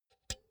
pull out cinch quick; objekte; elektronik

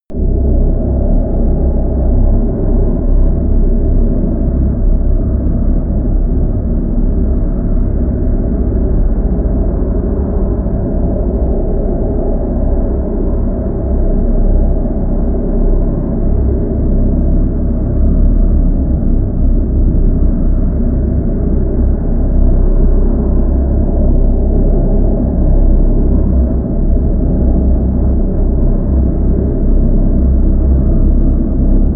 drone stealth low

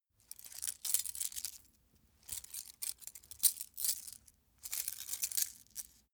small metal handling scrunch; industrie wirtschaft; metall

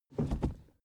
suv push forward gear across; verkehr transport; autos

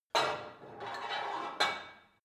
put weight onto barbell quick, fitnessstudio

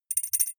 deny click; soundpool

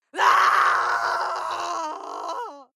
female lunatic scream pain long hard; schreie; menschen

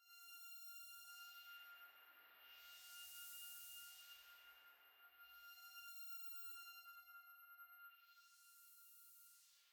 space ringing space wind; atmosphaeren umwelt; weltraum

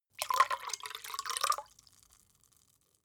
glass pour hard; berufe; gastronomie; soundpool

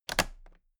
toolbox latches close heavy

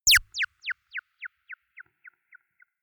mini laser shot; soundpool

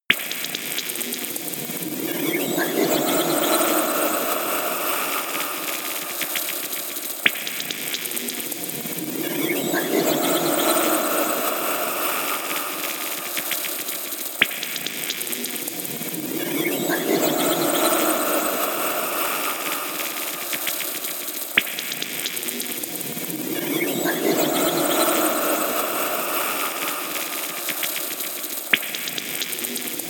space bubbles, sciene fiction

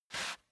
step barefoot wood plank slide short; schritte; Footsteps

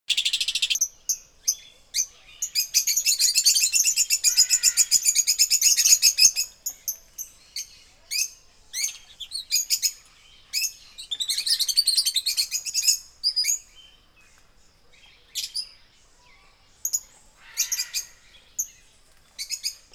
rain forest birds; atmosphaeren umwelt; dschungel regenwald

